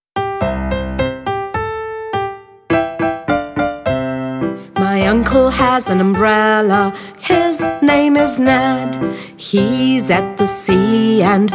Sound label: U song